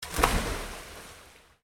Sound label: element water; maoudamashii